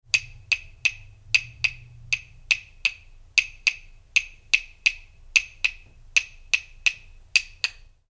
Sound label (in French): claves; Du Brésil à Cuba